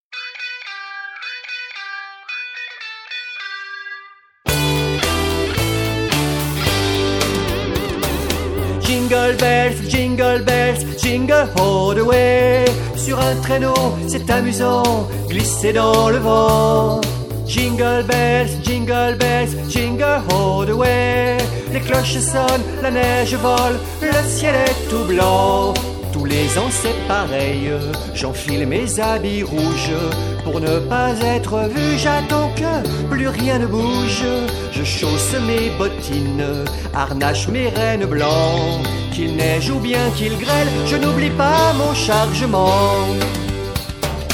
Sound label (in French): chanson noel jingle bells musique enfant